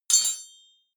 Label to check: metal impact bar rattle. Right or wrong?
Right